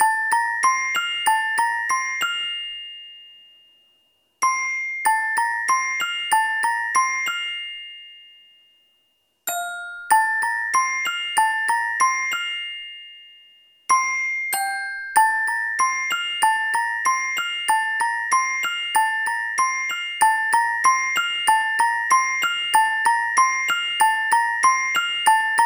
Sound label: Bells